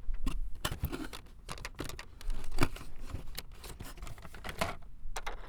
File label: Plastic Shuffling; BB Retail Therapy Sample Pack